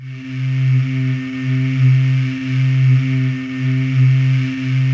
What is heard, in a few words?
DARK VOX C2, trance